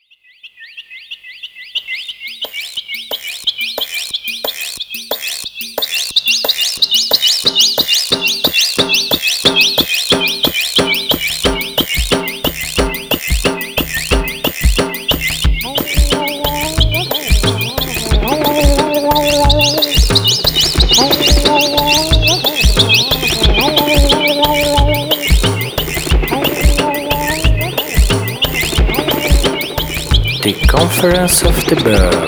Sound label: jingle; conference of the birds